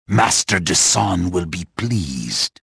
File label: victory; reborn